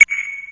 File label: Sonar Beep